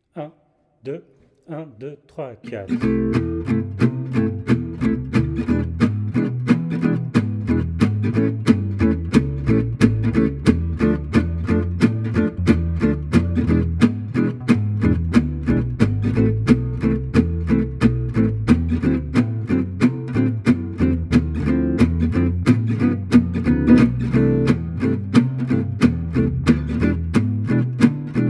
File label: Playback jazz manouche Gypsy jazz backing track; C - 160 bpm; MyVirtualBand – Manouche